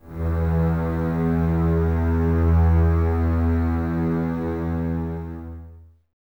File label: Basses E 2, String Ensembles; Miroslav Vitous; 90 s Sample CDs